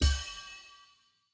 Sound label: scatter Hit; wilderland